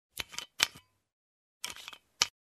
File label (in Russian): otkruchivajut probku